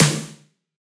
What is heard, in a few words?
drum hit normal